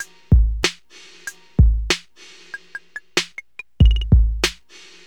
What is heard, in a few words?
synthesizer, Drum loops, Electro